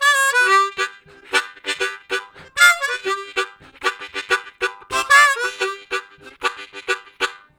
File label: Swoop Down, Harmonica, Riffs; Zero G